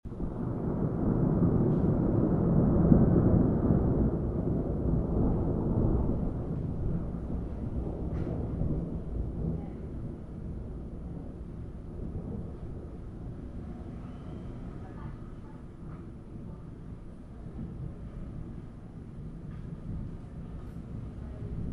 thunder and heavy rain on the afternoon, storm, weather, stereo; soundscape